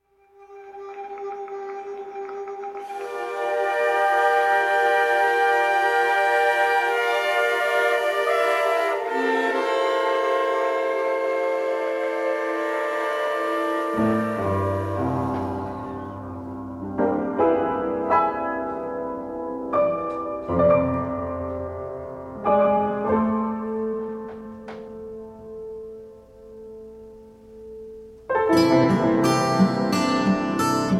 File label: Instrumental